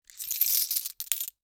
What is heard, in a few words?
coins in hand